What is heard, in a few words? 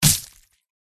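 moray attack contact mid; murena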